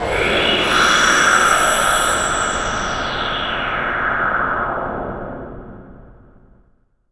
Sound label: NOISE, Production Effects